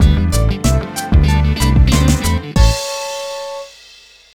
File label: BGM Announce Puzzle Challenge; Foto Frenzy Spot the Difference 2009